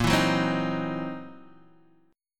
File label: C 7th Sharp 9th Flat 5th A sharp